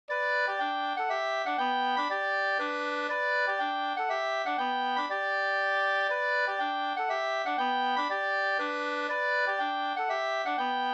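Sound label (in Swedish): polska, tvåstämmig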